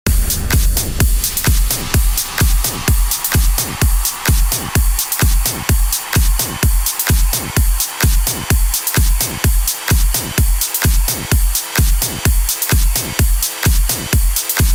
Happy Bug Noises remix

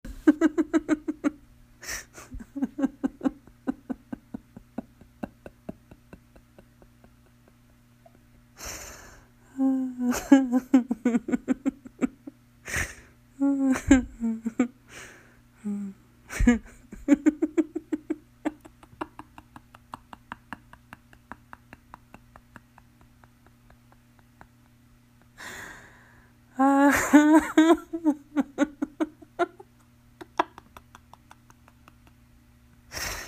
Laughing Challenge

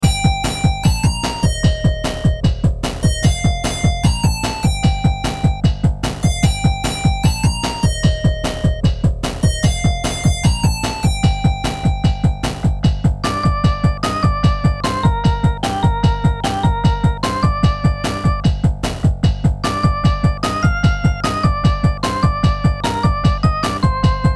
candle in the rain

